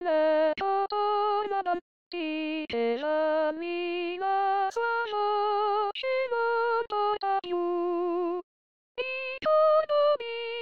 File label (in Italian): Coro